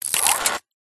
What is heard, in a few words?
MECHANICAL; PMSFX